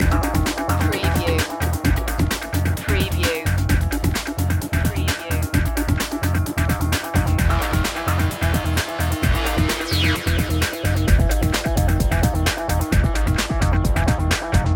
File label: grating cheese loop